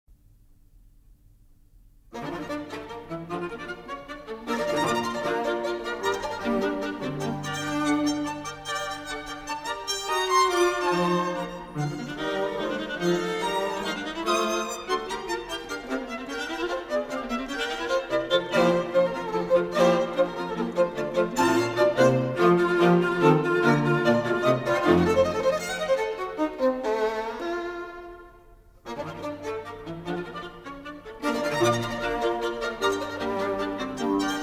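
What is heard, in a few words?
string quartet; gade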